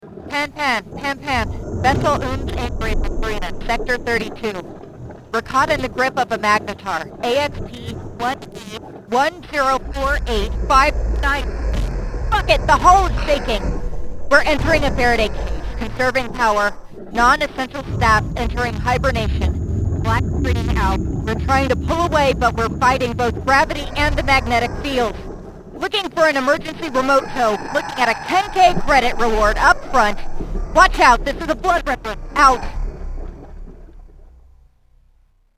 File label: Distress Signal; Mothership; Mothership Distress Signals v1